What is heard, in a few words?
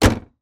Car Hood Close; Blastwave FX